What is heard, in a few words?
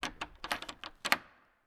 Bolt Lock; 99Sounds; The Warehouse